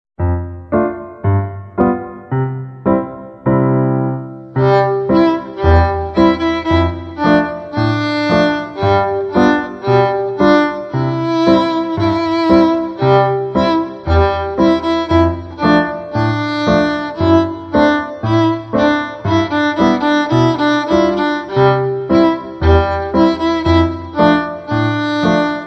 Die alte Dampflok, Viola; Fiedel Max 1 Viola

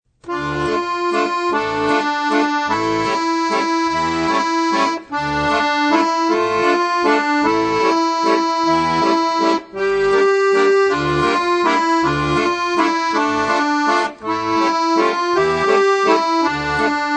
Erstes Stück in Moll, Akkordeon; Akkordeon Workshop Band 1

